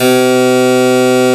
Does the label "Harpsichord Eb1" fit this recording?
Yes